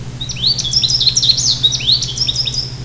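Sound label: goldfinch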